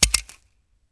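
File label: grass; Mafie 1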